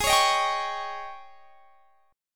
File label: G sharp Major 7th Suspended 4th G sharp; G#M7sus4 Chord